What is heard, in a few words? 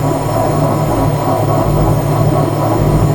loop; olaf ragnarok